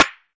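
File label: classic hip hop snare; Classic Hip Hop Mega Kit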